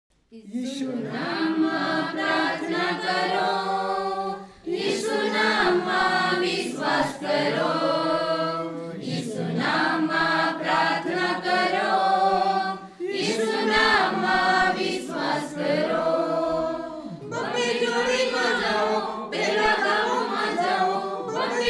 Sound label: Song, Gujarati; ખોળાયેલો સ્દકરો; Lost Son